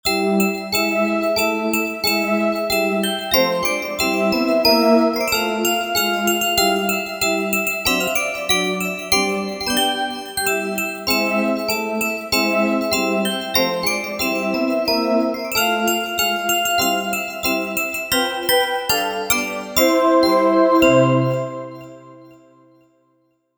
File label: bells; cms weihnachtskalender 2023 freeware